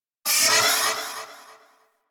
Iron Blast Cast Fully Charged Proj Explosion Sweetner; Warframe; Warframes